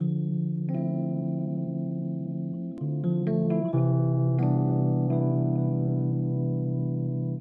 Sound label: new music